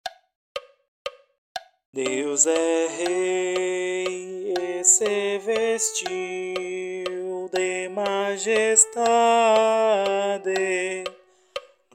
Cristo Rei coro

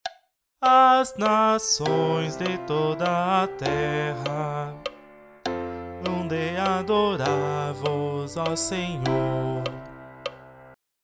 Coro Epifania do Senhor

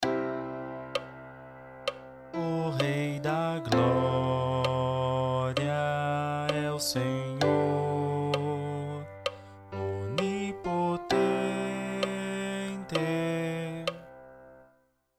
Coro

